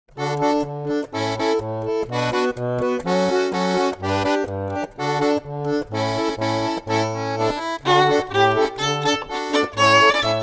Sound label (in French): bourree 125 bpm; boeuf trad